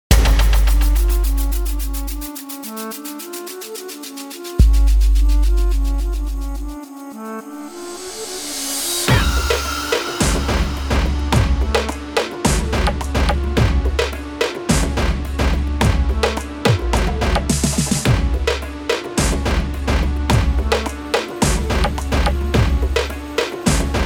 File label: Dhol Competition Song